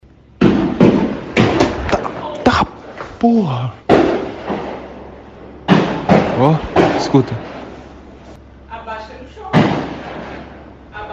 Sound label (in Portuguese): TIRO